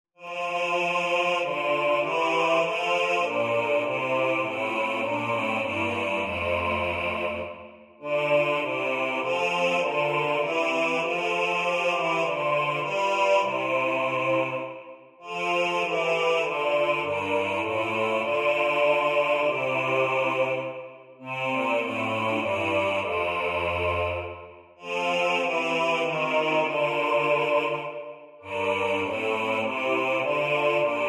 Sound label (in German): Mein schönste Zier 3 stimmig Männerstimmen